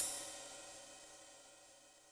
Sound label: Ride siz C, Cymbal, Yamaha DTXpress; drum machine